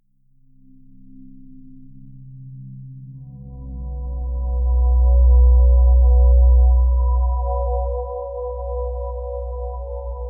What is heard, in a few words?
Ambient